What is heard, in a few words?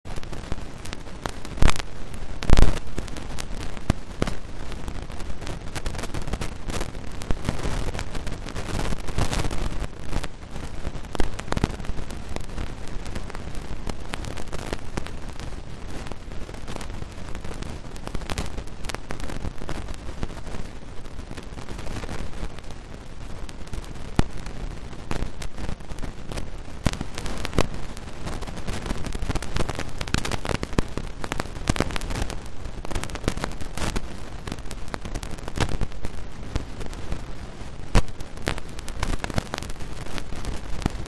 riverside; Kyoto U; Ashiu